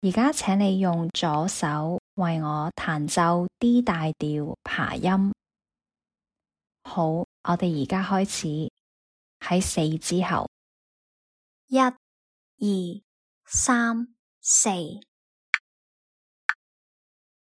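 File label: D major arpeggio left hand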